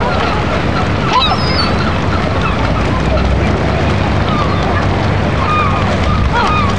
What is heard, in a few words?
ocean light loop